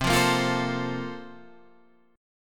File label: A sharp Major 7th Suspended 2nd